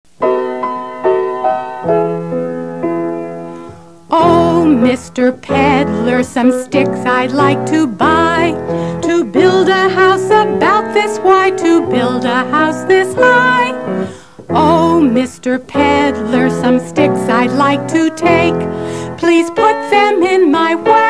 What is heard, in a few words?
Pig To Stick Peddler Song Vocal; Pig-To-Peddler Song (Sticks)